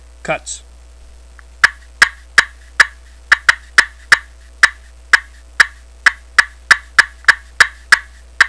slate cutts, turkey hunting

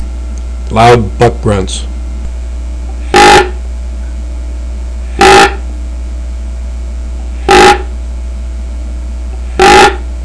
grunt loud; pro grunt master

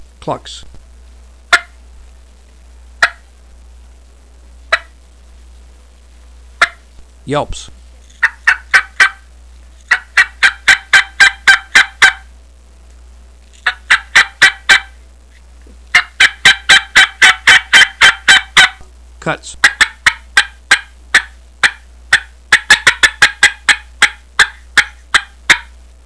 primos true double

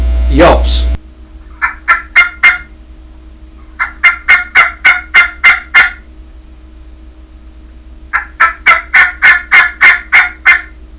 dia yelps, turkey; dixie

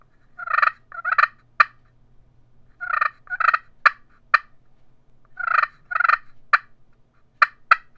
the green machine clucks purrs, turkey; Southland

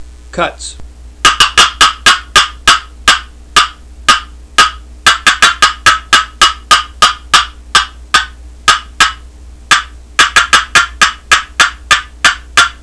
box cutts, turkey; canecreek

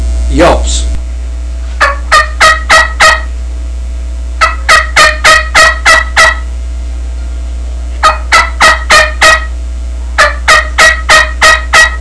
blackbeard yelps, turkey; Southland Sounds

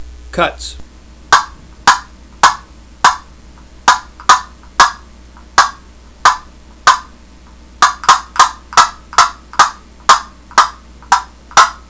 super yelper cherry cutts, turkey

